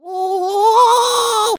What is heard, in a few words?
woo oh; ooh ahh; ho hoo woo